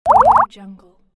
bubbles

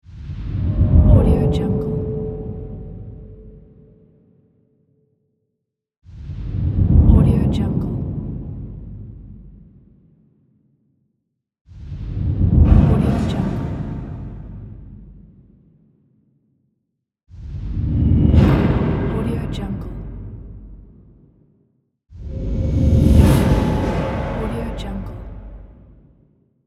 low industrial whoosh

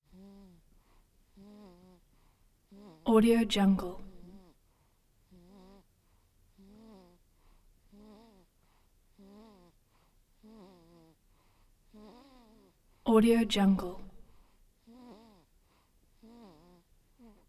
baby wheezing sleeping respiratory breathing; prosoundfx

